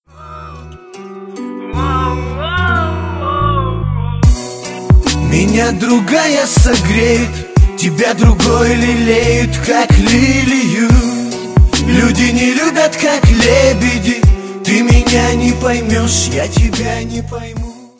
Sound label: music